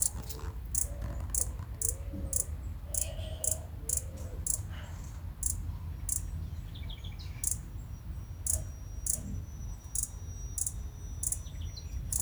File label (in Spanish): Picaflor bronceado